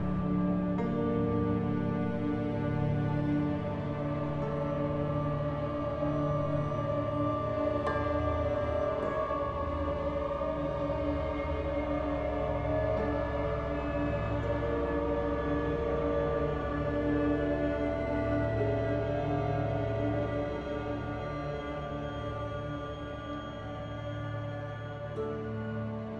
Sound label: Loop Exploration